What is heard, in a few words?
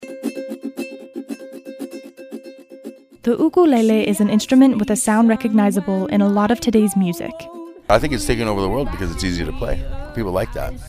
UKULELE